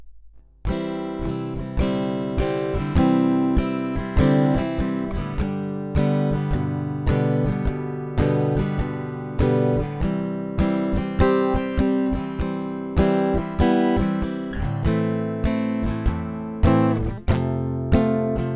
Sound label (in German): HarleyBenton GS Travel E Mahagoni; Harley Benton GS-Travel-E Mahogany